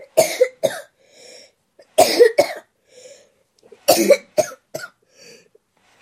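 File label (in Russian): бесконечная буря утомительная, звуки кашля ребенка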